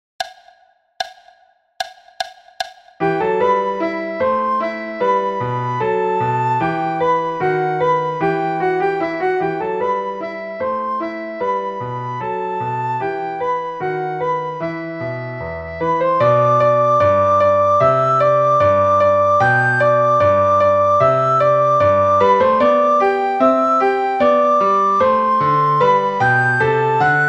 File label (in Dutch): sopraan; Aria Telemann; Flierefluiter 7